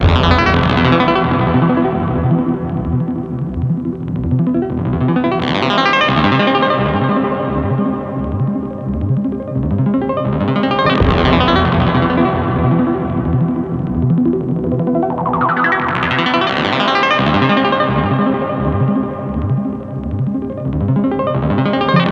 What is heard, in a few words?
ringtone